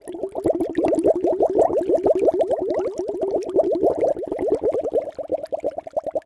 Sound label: Fx Mushroom Boil; Levels Hub Swamp; Cook Mushrooms